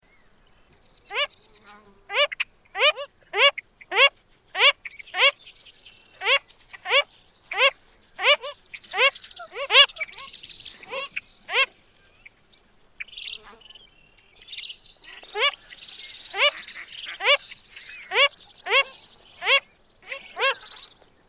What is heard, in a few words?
Wilsons Phalarope, Phalaropus tricolor; shorebirds rails